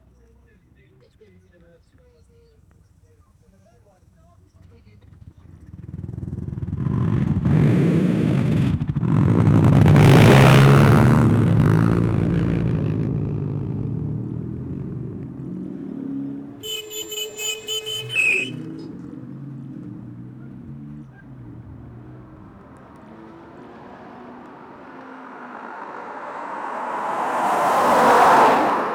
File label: engine V8 Mercedes AMG